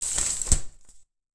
open umbrella

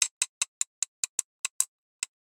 dishes

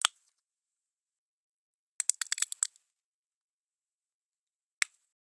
squeeze bottle

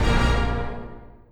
da dan, environment

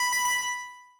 alart

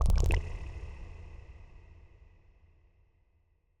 water; environment

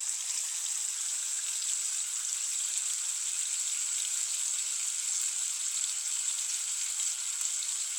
pan fry

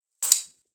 close umbrella

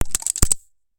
rubber band

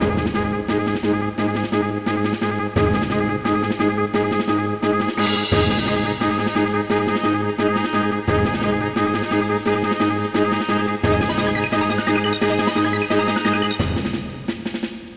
space orchestra

